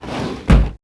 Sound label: wooden desk drawer close; breakable